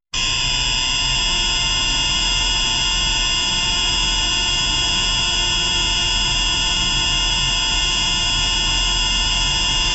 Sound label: metallic servo motor